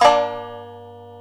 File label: shamisen; SYAMI